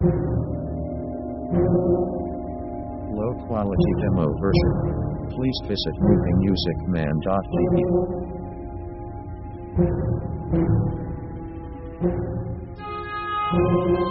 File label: orc dark